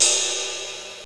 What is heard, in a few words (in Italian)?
piatto